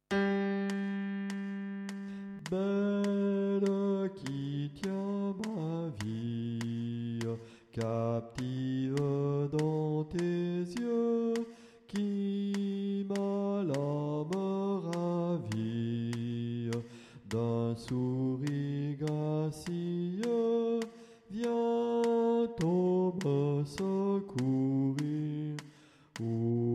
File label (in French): basse